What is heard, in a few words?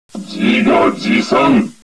taunt